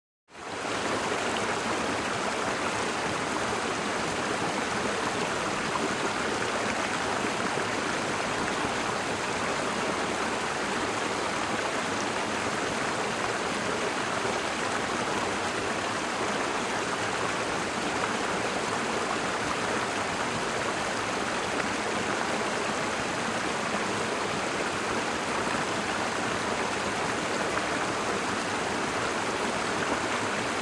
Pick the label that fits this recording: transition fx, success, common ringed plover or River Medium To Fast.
River Medium To Fast